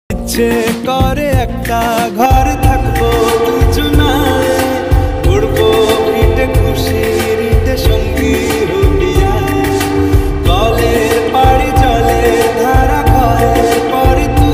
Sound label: ringtone